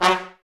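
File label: brass trumpets F#; Miroslav Vitous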